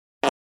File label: Fart a Squeaker Fart Close Perspective; Human Sounds